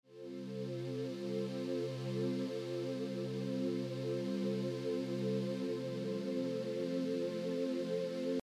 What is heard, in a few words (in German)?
Sidechain Pad pur